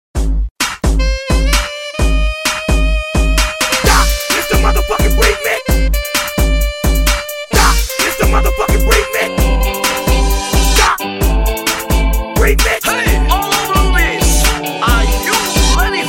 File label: Ska